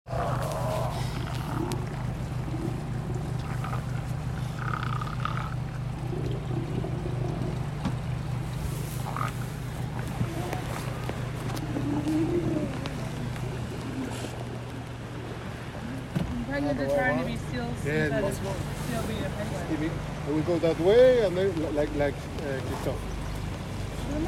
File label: Gold Harbour South Georgia morning landing